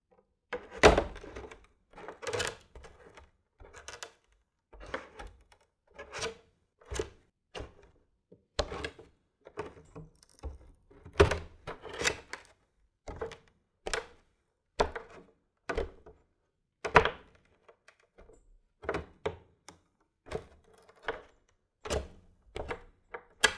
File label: Creaky Wood Barefoot Walking; Todd Blankenship